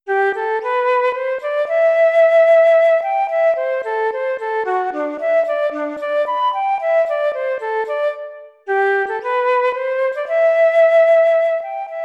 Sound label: Flute; Band Audition